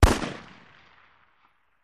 Machine Gun Burst; bursts

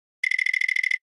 Flash Gear Multi HF Me, flash gear

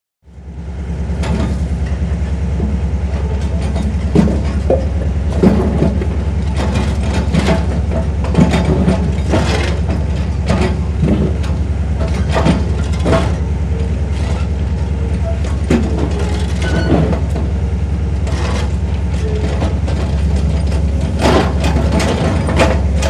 Digger; Kubota Digger At Work; earthmover

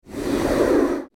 Slow Whoosh Flying Th

